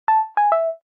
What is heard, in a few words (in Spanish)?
mensaje motorola